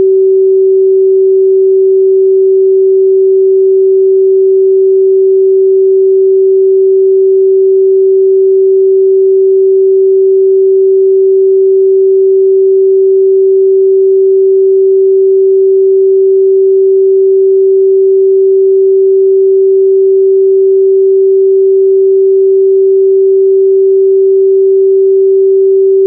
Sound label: 382 SINE LOG; SINE WAVEFORMS